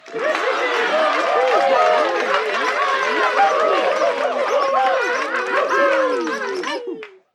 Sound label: end super applause; Pix the Cat